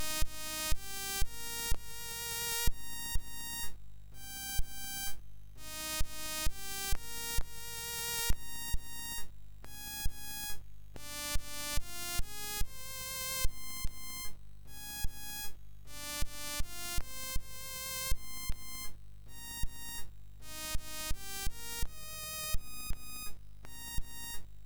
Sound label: Strauss, MOS6581; hvsc